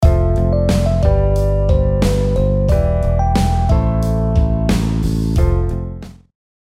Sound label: melo chord